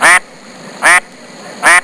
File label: Goose